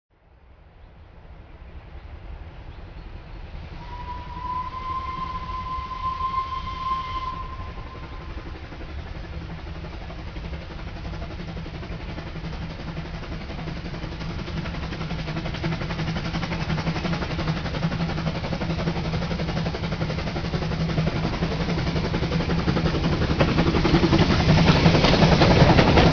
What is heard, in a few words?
Steam train passing